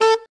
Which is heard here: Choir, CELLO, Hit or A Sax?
A Sax